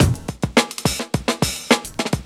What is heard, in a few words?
JAZLOOP; SoundFactory; DRUMLOOP